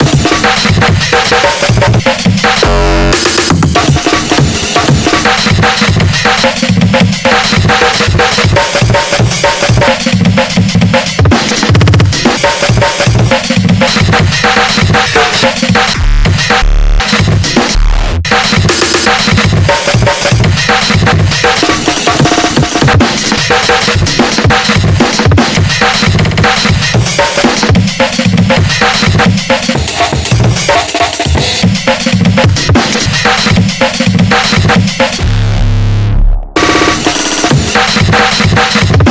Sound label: Dress Cycle (Drums)